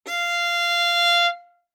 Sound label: Violin arco ff sul E F5; MIS Pitches - 2014; Strings